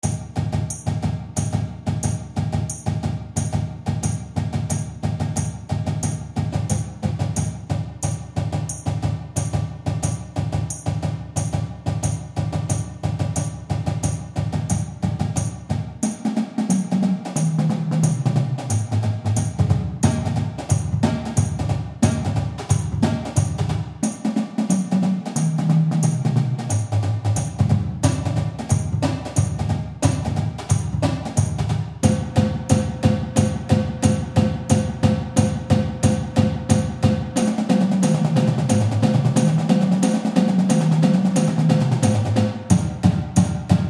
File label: Du Duts 90 BPM